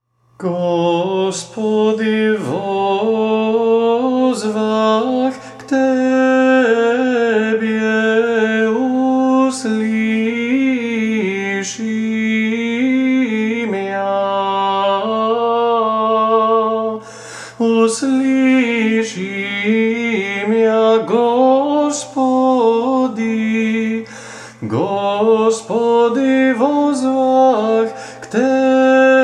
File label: Gospodi Vozvah cetvrti glas, Serbian chant